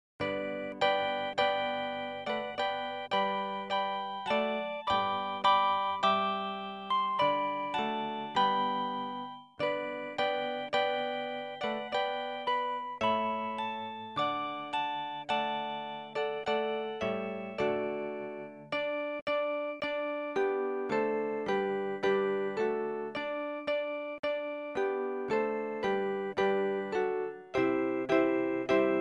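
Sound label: instrumental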